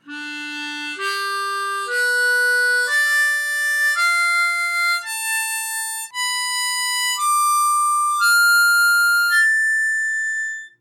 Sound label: C major draw 1 to 10